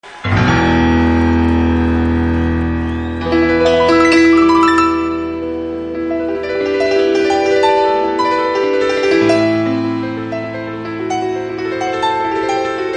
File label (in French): solo piano